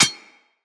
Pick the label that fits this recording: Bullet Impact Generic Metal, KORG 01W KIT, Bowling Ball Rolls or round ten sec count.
Bullet Impact Generic Metal